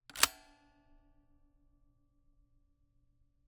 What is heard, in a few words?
Camera, Kodak EK2 Instant, polaroid, large, plastic, springs, release; Eiravaein Works; Vaeyan IV